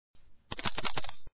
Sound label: Multi Coins; papas hot doggeria; android; x68000